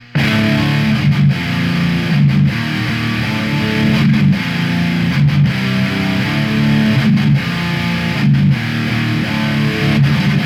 METAL

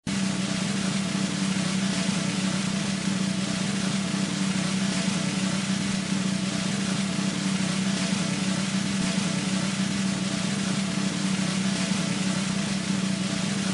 Drum roll no cymbals

